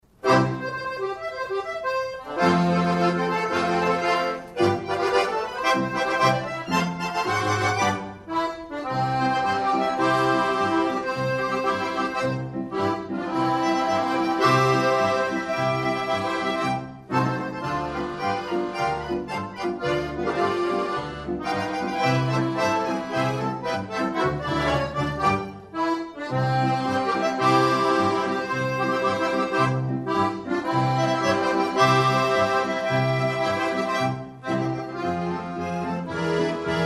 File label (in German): Fanfaren Marsch